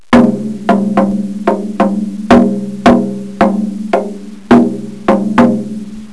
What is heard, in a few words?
DRUM